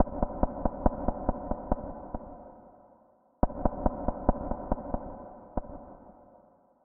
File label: Old Skool Neve Loop